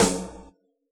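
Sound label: snare drum HipHop, Yamaha DJX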